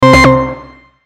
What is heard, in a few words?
Menu Noise